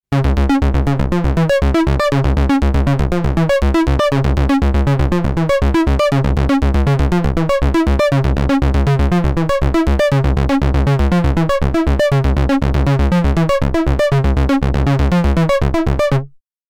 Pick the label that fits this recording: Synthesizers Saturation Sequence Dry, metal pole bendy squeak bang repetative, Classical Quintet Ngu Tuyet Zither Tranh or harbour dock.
Synthesizers Saturation Sequence Dry